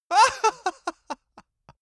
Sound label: male laugh bright